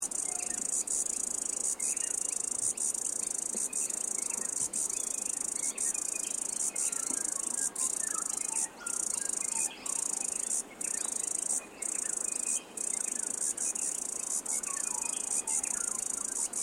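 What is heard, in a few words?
Insect Australian Cicada; Various Australian insects; Cicadas; Animals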